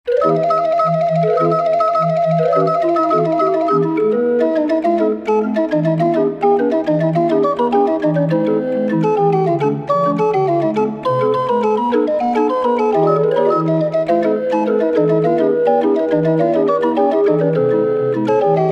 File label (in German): 36er drehorgel